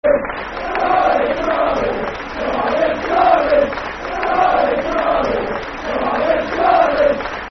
Football Chant (Soccer Song); Charlie, Charlie; Brentford Bfc

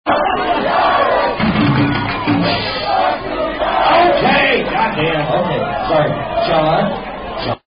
Piano Bar, College Football Chant; Fuck The Nd Irish - Piano Bar

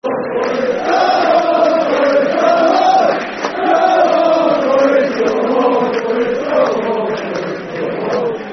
Come On Forest Football Chant; Nottingham Forest Nffc

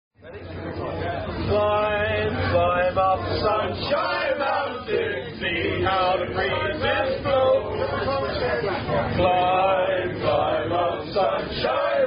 Unknown Rugby Song; Sunshine Mountain April 2010 - Ventnor Rfc

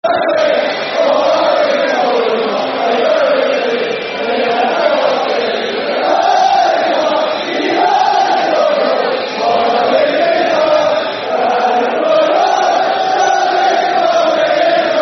Football Chant (Soccer Song); Valley Floyd Road; Charlton Athletic Cafc

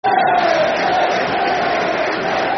Football Chant (Soccer Song); Charlton Athletic; fanchants-com

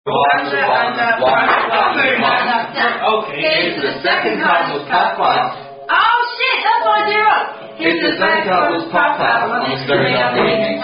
Drinking Game; The Cardinals Puff; Sweedish Version Of Are You A Cardinal Drinking Game (Variant)

